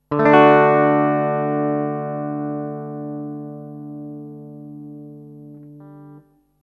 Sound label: d major, chord basics